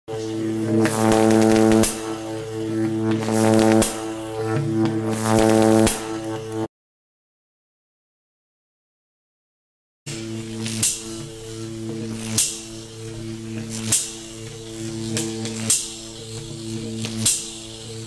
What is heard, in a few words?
Electrocution Buzz